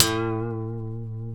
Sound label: B, funk guitars; Spectrasonics; Hans Zimmer Guitars VOL-1; TAKA FUNK